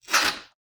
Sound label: tape off; soundscrate